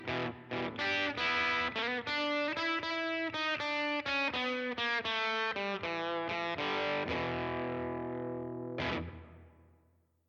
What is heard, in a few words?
Blues Intro